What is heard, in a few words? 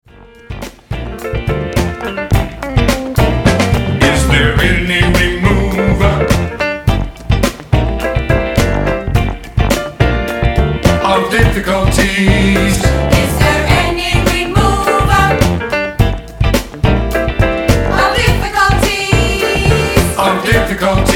rb funk